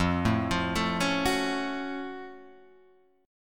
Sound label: Gb Minor Major 7th F